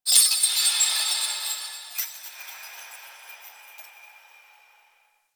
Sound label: Moon Chimes Small, Ambience; Warframe; Orokin